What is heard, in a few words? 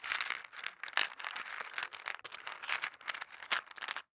japanese chess gather